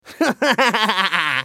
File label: spell cast effort; Rumble